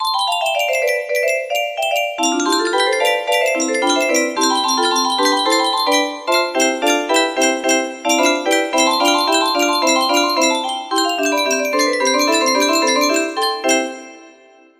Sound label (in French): boite a musique